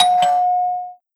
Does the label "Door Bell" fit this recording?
Yes